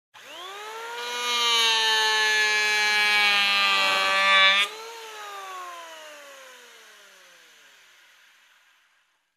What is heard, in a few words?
Construction